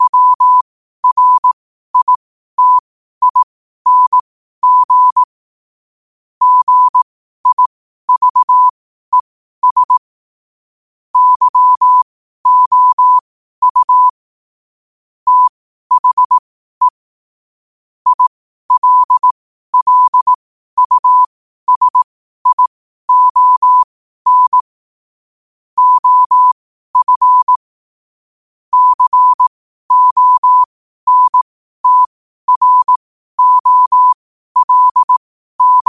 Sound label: 5 wpm, morse; cw; citas